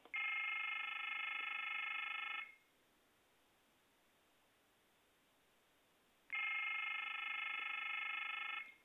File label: old cordless phone ring